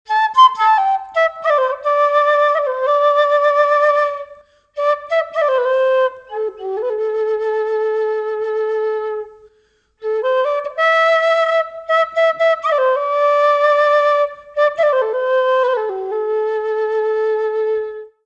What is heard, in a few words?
kena